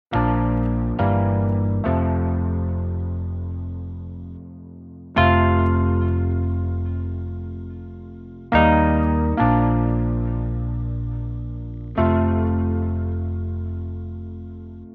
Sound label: (F To Gb) No Backing Vocals